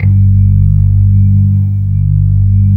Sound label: G1, Keyboards Old School; USB Soundscan vol.09; AKAI